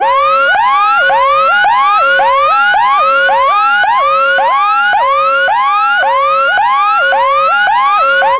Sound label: ambulance yelp siren